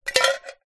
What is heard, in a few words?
tin can lid inside empty tin shake; foley